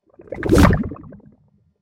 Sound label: liquid bubble swoosh